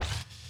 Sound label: Flyer APPEAR